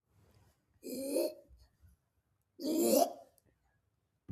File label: vomit sound